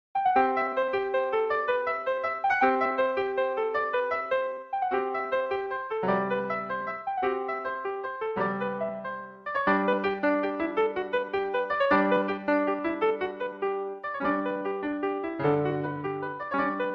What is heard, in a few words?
Barn Dance